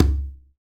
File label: Conga Heel, Perc; LA Perc; Akai MPC-500